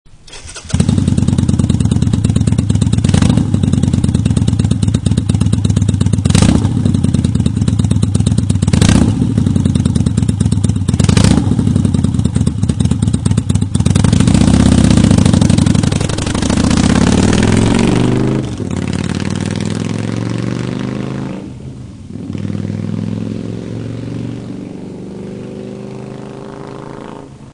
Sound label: Honda Shadow ACE 750 52V Twin engine sound; Section Six: The Soul of a Bike – The Engine